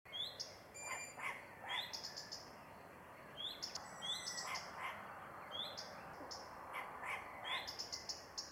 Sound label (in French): adulte hibou